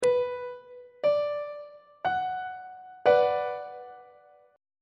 a double sharp minor chord; on treble clef

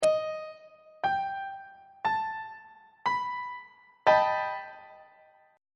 b augmented 7th 1st inversion on treble clef

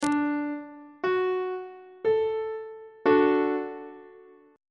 e double flat major chord on treble clef

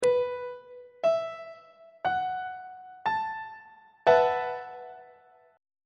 b dominant 7th suspended 4th chord on treble clef

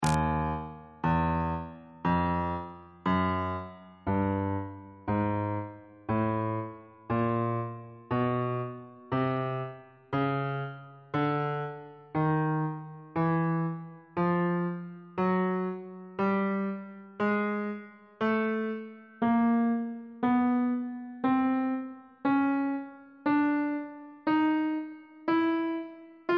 sharp and flat note names on bass clef

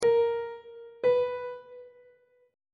a sharp 1st intervals on treble clef

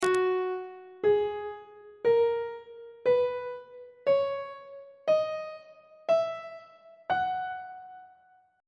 f sharp mixolydian mode; on treble clef

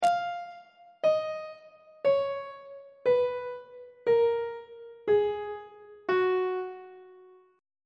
g flat ionian mode descending on treble clef

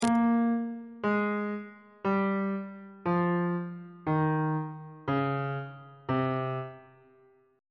b sharp phrygian mode descending on bass clef

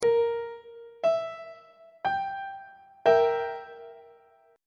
f flat diminished 2nd inversion; on treble clef

